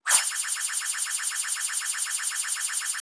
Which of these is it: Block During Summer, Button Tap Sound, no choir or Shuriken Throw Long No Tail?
Shuriken Throw Long No Tail